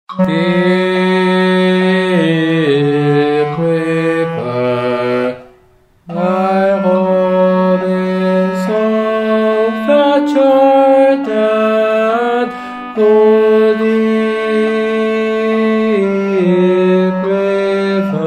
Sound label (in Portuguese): tenor; deep river